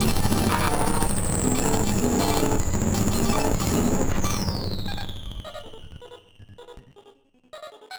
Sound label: Battered Synth; malfunctioning synth